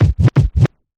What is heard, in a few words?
Double Kick Scratch